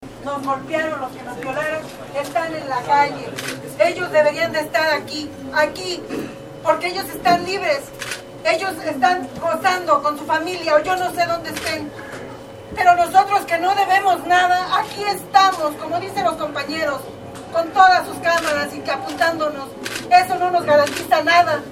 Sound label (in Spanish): mujer golpes; presas del penal de santiaguito